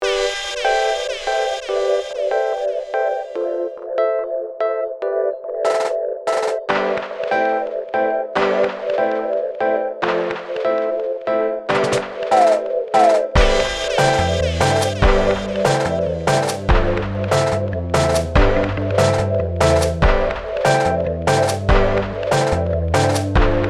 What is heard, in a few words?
Reggae Riddim